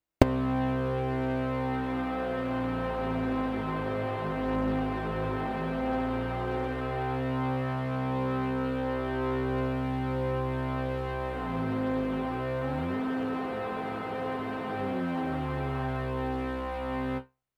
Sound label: Polymod Frequency